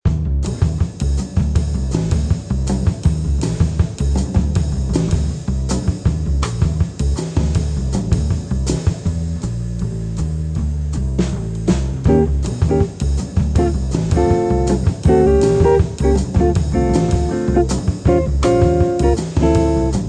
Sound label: backing